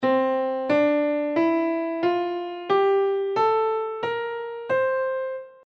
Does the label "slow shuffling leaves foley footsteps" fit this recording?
No